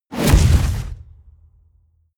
incoming explosion

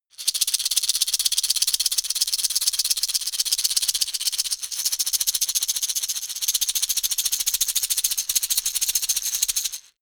shaker wood rattle fast hard

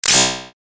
short electric hum

